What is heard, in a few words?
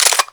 Reload, weapons; Hek